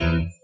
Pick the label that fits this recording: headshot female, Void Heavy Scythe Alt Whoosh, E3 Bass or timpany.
E3 Bass